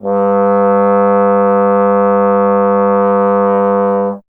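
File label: bass trombone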